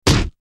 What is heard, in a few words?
kickball